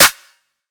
clap one shot hi; Claps

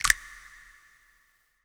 click verb; Percs

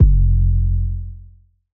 808's

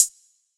HH, Closed Hats; TGod

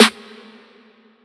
Snare

